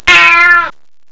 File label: CAT